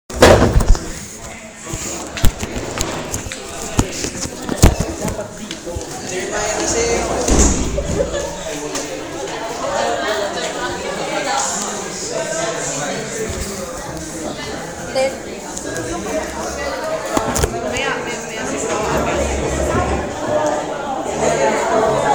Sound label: classroom noise